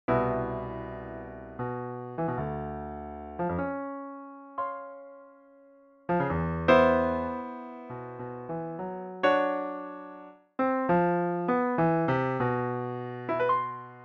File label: short piano pieces